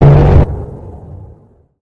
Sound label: gsh gun; mcheli